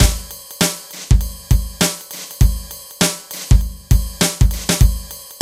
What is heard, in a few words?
JAZZ; INIS; Funky Xtreams